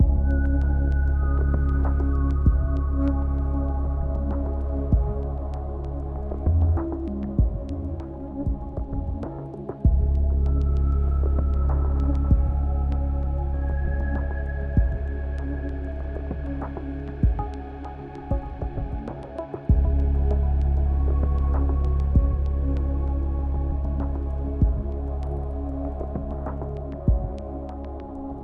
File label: Ambience 97 5BPM